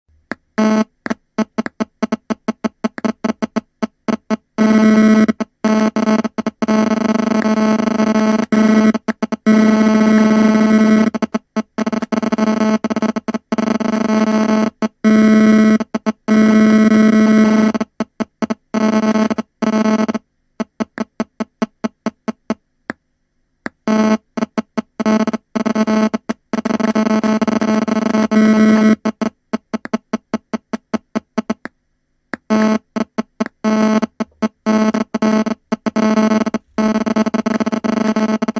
noise

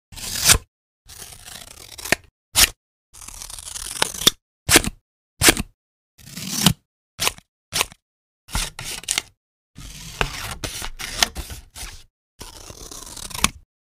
Cut cardboard

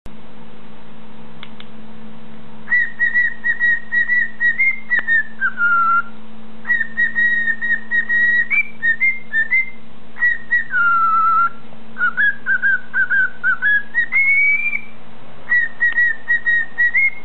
Singing Lutinos; آواز خوشگل عروس هلندی لوتینو

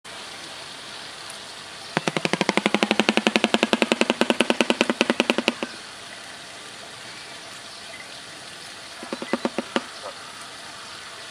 shoebill stork sound

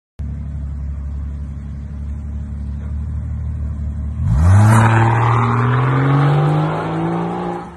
rolls royce

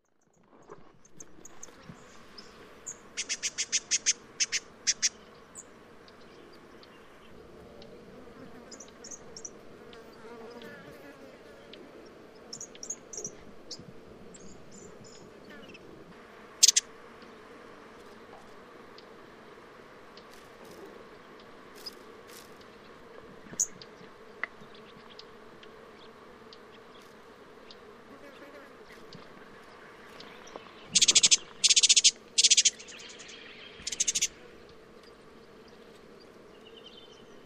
spotted scrubwren

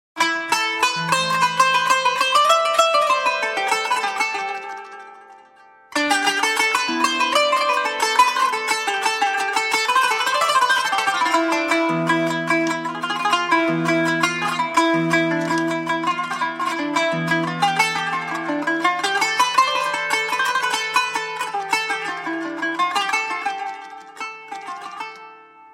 traditional ringtone

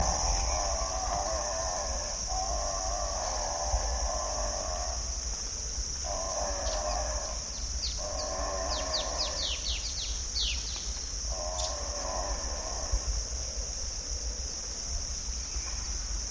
Abbott's booby, پرنده; بوبی ابوت

